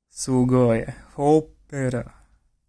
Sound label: operaaaaa